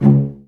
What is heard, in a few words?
CL PIZZ C1; Best Service; Peter Siedlaczek's Advanced Orchestra